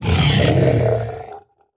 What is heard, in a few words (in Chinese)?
僵尸疣猪兽死亡声